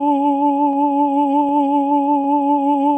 ahh D

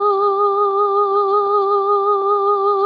ahh Ab

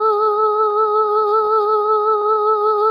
ahh A

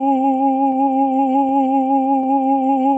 ahh Db

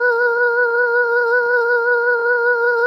ahh B

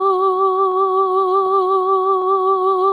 ahh G

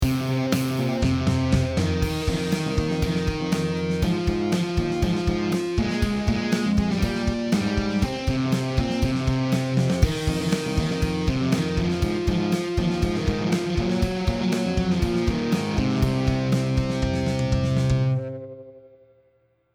cheesy rock

